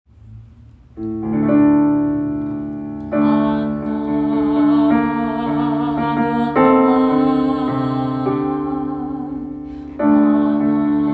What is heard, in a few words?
Altos; Shirei Chesed Fall 2025 Recordings